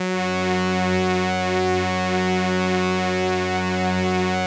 Atmo Pads; Best Service; XX Large

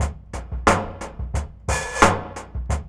LOOP, DRUM; E-Lab; X Static Goldmine 2

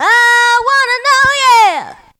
VOC

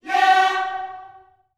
"yeah" F#4, Gospel; Spectrasonics; Vocal Planet Vol.1 Gospel; akai

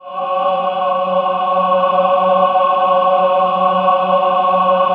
CHOIR; Best Service; Robot Computers Akai

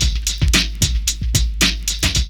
LOOP; E-Lab; X Static Goldmine 2; akai

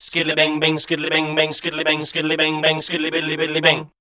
SCAT #D, Voice

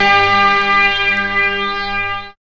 PAD; E-Lab; X Static Goldmine 1

